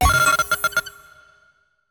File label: Mine Trip